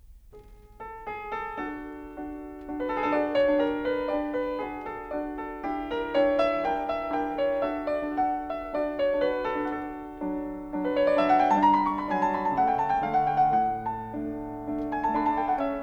Six Variations in F Major for Piano, Op. 34 Var. I; Beethoven